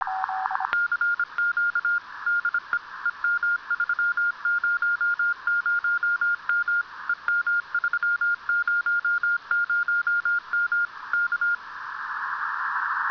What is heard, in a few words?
comm amb, ambience